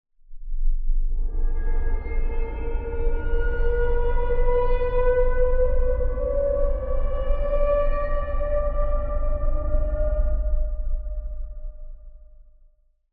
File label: Doom Mist; sfx; Sylenth1; Routed Sound; Sylenth1 Expansion - Doom Carpenter Vol.1